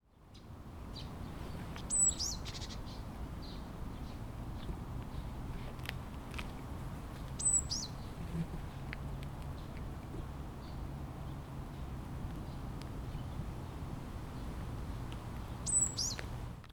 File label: sizyuukara, bird song